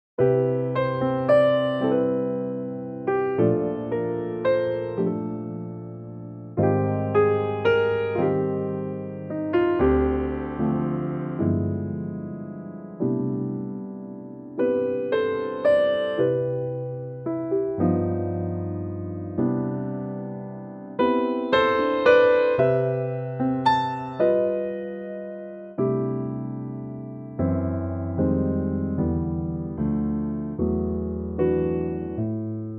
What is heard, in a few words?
piano C